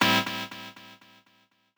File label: stab